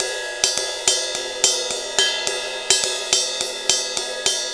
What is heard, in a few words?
Ride Cymbal Pattern; Percussion; Essential Percussion; Ride Cymbal Patterns